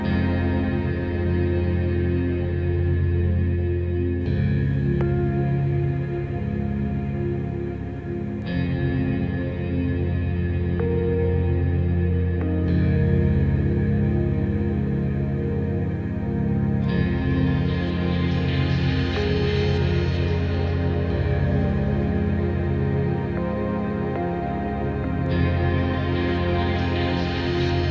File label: Boss Loop; Summer Catchers